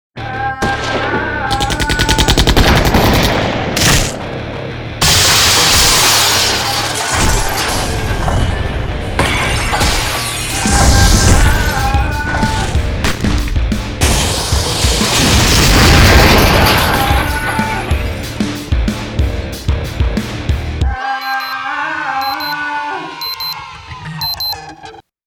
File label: Binaural